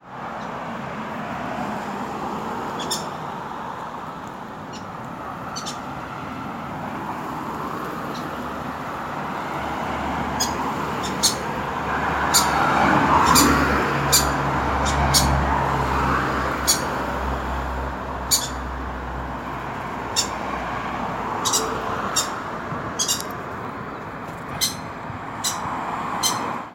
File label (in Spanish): Chiripepe Cabeza Verde LLAMADO, Maroon-bellied Parakeet (Pyrrhura frontalis); BELGRANO